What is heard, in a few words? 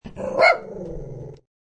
Small Dog Bark, Excited small dog barks; Dog Sound Effects; Animals